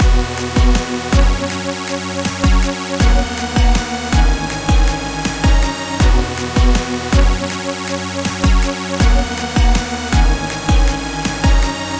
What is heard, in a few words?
Backing